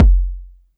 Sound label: BD